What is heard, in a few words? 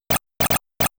Zapper Shoot; Xevious